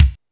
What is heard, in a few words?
SP1200; DRUMMACHINES